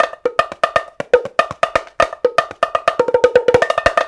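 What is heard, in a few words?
120 bpm, Bongo Tonga; Spectrasonics; Supreme Beats; African Contemporary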